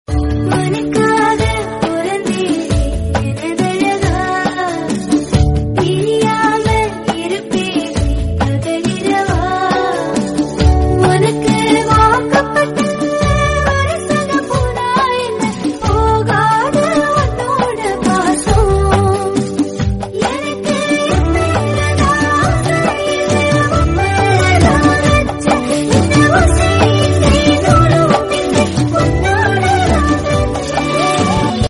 Female Version Ringtone

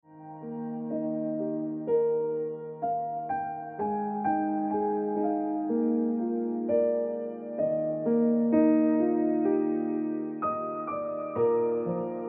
Like A Radio Song Ringtone Piano Music

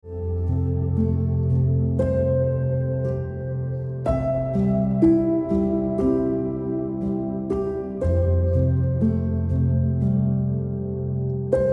Just You Ringtone Piano Music

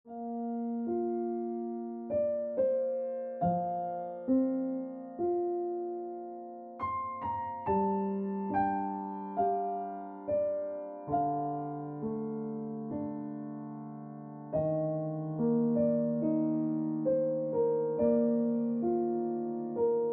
Piano Music

